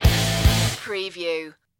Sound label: japanese cartoon theme stinger